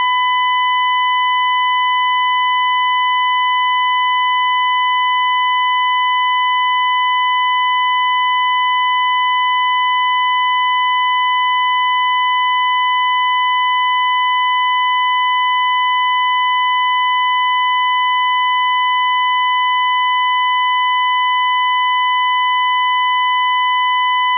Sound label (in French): dent scie